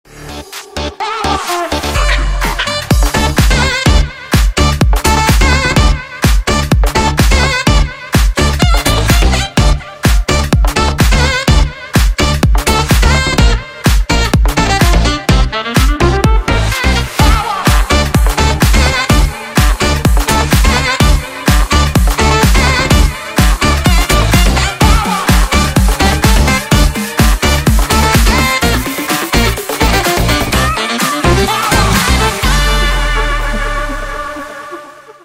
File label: sax